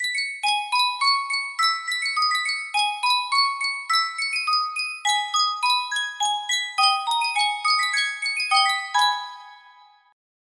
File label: Sankyo miniature music box